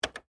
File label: Computer Keystroke, Technology, Computer, Keystroke, Single, Large, Terminal, Keypad, Vintage; BlastWave